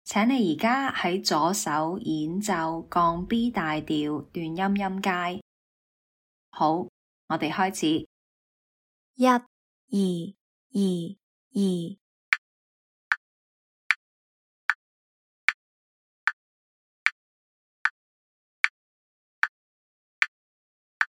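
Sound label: B flat major staccato scale left hand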